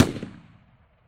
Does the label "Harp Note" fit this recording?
No